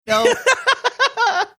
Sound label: cute laugh